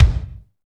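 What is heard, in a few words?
kick, Techno Rock; Northstar; Drumscapes Roland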